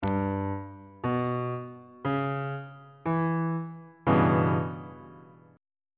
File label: f flat minor 6th 1st inversion on bass clef